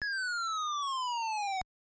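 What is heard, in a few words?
phone notification sounds in 8-bit style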